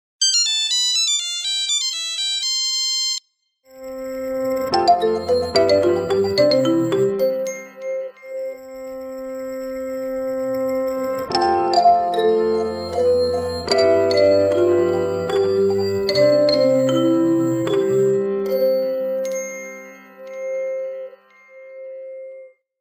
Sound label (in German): Nokia Melodie